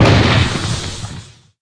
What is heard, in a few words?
Panzer Faust Fire; Red Shark